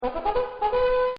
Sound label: BUGLE, Bugle Plays Taps; Horns; Musical